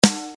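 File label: comp snare; synth basic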